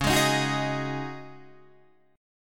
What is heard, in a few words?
C sharp Suspended 2nd Suspended 4th C sharp Guitar Open D, chord; 11,11,13,12,11,13